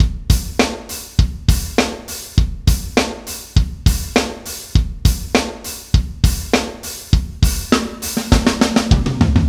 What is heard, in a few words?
101 Indierock Drums Fill; Pro Tools Essential Demos; Essential Loop Demos; Demo Session - Rock Hard; Digidesign